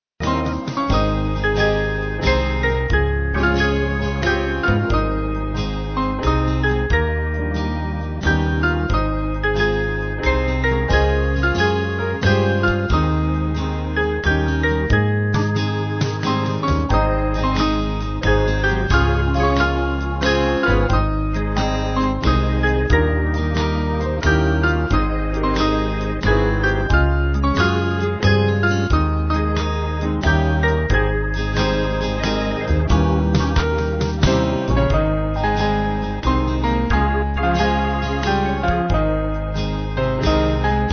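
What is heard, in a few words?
Band, Music Backing Files for Churches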